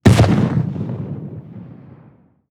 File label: stike impact; weapons; dota; monkey king